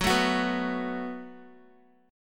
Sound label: F sharp Minor Double Flat 5th F sharp down Guitar; strummed